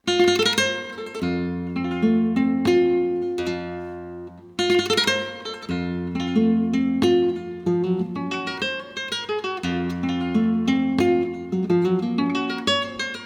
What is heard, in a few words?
Azul alegria; Sinfonica